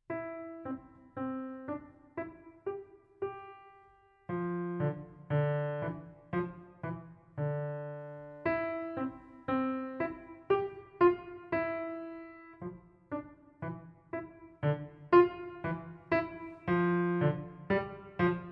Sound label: piano